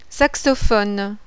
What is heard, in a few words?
SAXOPHONE; INVENTION